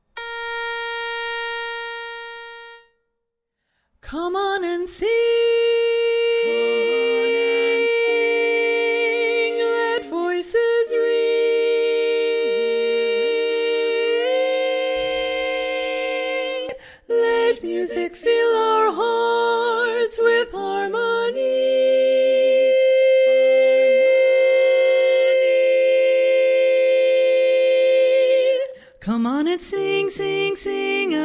lead predominant

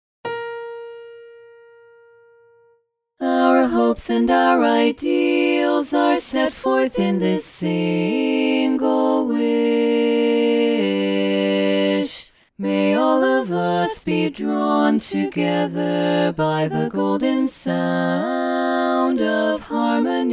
learning track lead missing

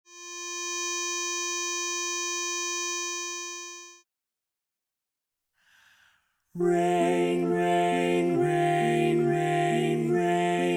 lead left

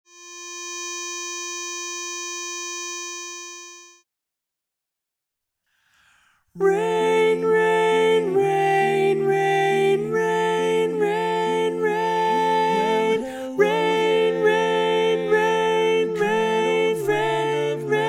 tenor predominant, chorus

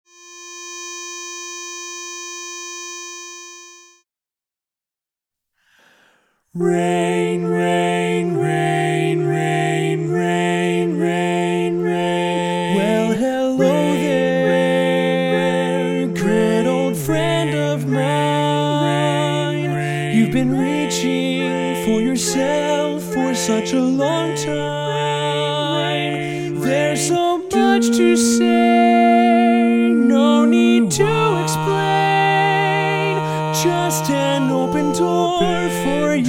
no baritone

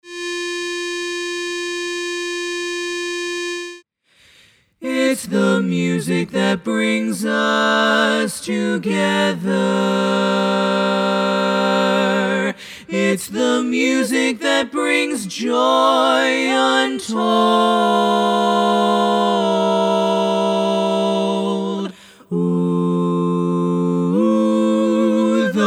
learning track lead missing

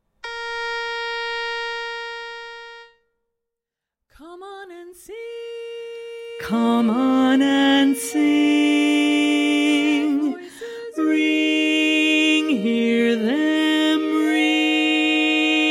bass predominant, chorus

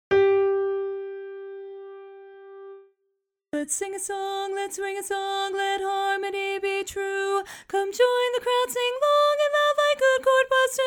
lead alone, chorus

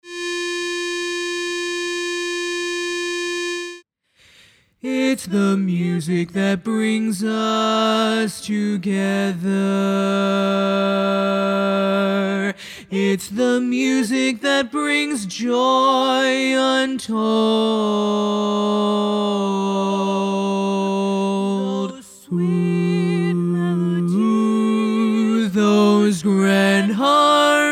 learning track bass dominant

